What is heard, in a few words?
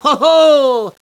"ho hooo"; DS; ML BOWSERS INSIDE; Luigi